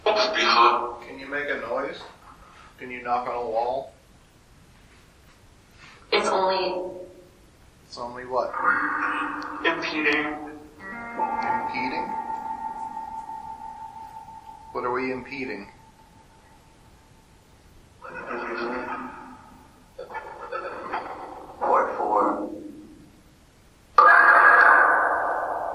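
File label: 1st Floor Doll Room Phasma Box; fairfield county infirmary investigation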